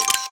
camera; NEC Casio MEDIAS X N-07D

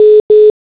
call wait; HP iPAQ Glisten

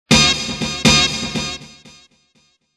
Alert Old School Hit; HTC Touch Diamond

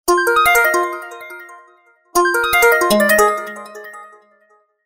Bell Animato; Samsung SCH-U490 Trance

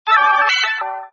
Bells; Hijacked by MTV

